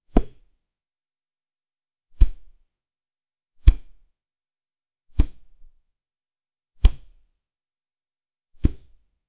sound light soft plop

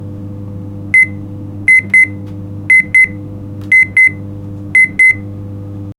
classic ding sound, chime

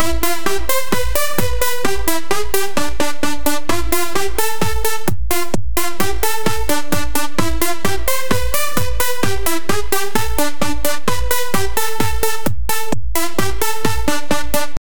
deejay, party

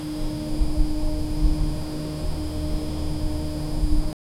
drone flying sound over, bird chirping

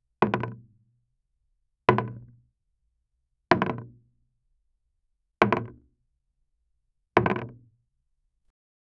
apple fell into a table

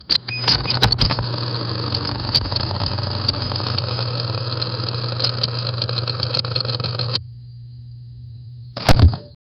loudspeaker crackling

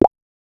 short satisfying plastic; wood

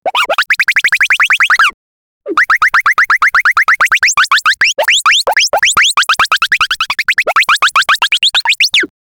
fun cartoon sound effects

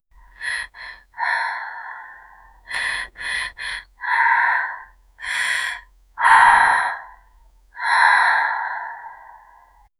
create the sound of a, smoking

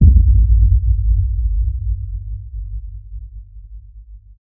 cinematic gym background, gym

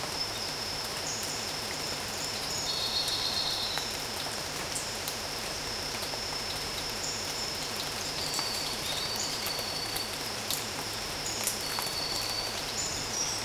the sound of the jungle, animal roar

